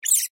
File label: rat grunt; minecraft; technic